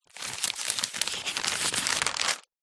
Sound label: household magazine cheap page turn